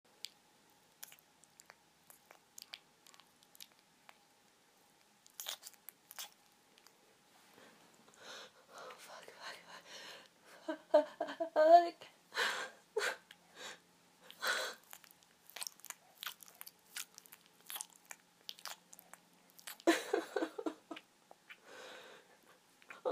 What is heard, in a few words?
squishy sounds, LOUD, wet noises, moans, snek sounds; F4A; GWA Sneksturbation